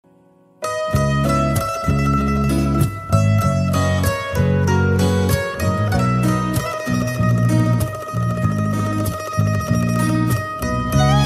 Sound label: Sad Romantic Guitar